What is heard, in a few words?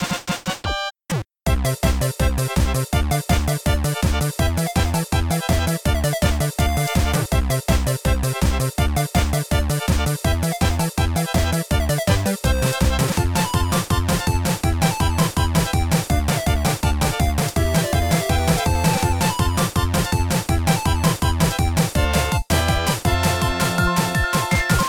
Bgm minigame fever